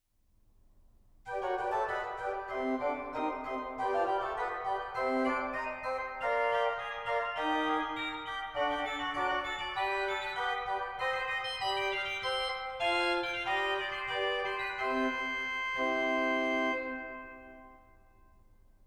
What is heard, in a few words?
Mixtur 4f, organ; 1844 Franz Xaver Engelfried Organ; goenn